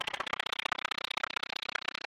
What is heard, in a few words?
Increase Level; Lethal Company